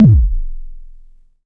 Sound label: Long BD, dry kicks; Quasimidi Rave-o-lution 309